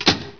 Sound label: LATCH; PC Gamer IT CD 38; Requiem